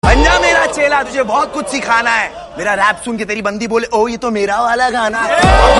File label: rap